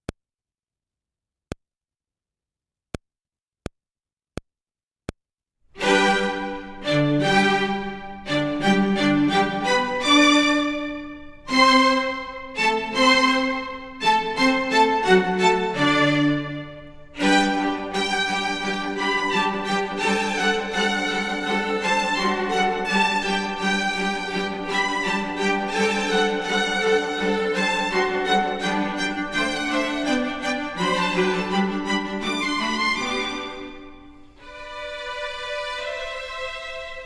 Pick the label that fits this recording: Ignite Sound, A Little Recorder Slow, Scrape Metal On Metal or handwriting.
A Little Recorder Slow